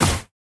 Astrum Deus God Ray

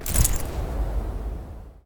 Smoke Bomb Reload; Desert Prowler

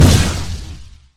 Plasma Explosion

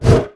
Hit

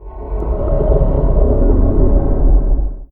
Reaper Search Roar

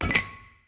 Hadal Urn Open

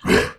Angry Dog Hit

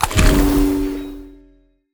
Miracle Fruit Consume

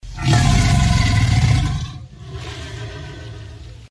Leviathan Roar Charge

